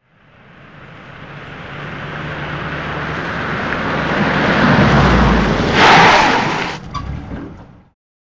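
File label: Stop Old Citroen Approach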